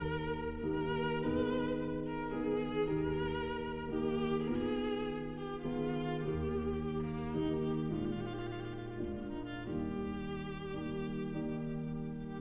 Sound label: Sicilienne for violin and piano